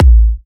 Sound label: Kicks A; markus hakala